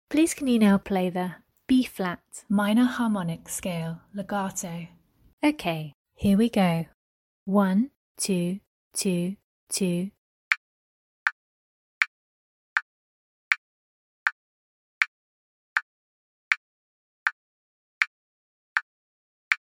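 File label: B flat minor harmonic scale legato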